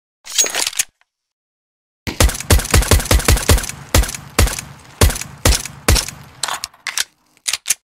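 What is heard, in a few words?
USP-S, CS:GO weapon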